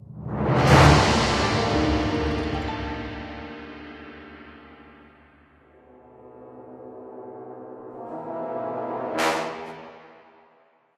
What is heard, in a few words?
sting; PLANT POT; The LEGO Ninjago Movie Video Game; PS4; Windows; Xbox One